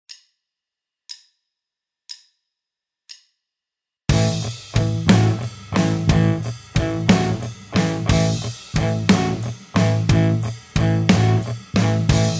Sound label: Rockschool backing; Guitar Techniques 2019 Issue 296 June